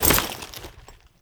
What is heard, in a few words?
foley step; Warframe; Characters; Kahl